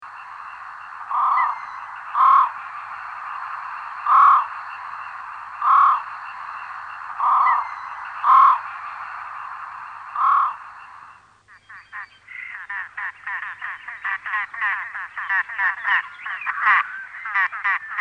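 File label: Great crested grebe; Madarak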